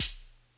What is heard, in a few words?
subtle hi hat sample a key, hat one shot tuned to the A note